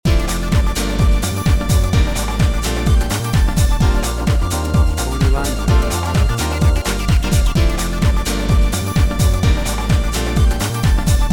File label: Basketball Music